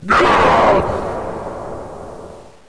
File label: zombie pre idle; left4dead2